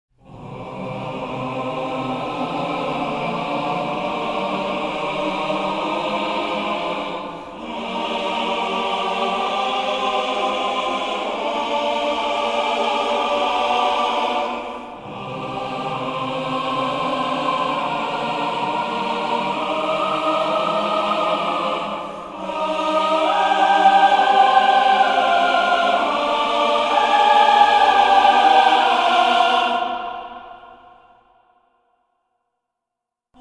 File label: Choir Only